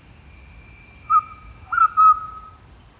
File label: birdcall, Unknown birdcall; canberrabirds